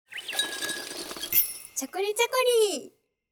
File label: soundlogo